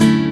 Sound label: Synth Sound; Vengeance Samples; VEE Synth Shots